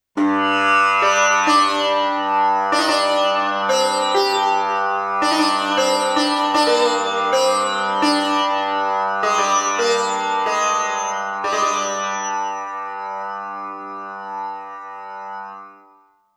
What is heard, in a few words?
Sitar w Dm; xp-80; roland demo cd-3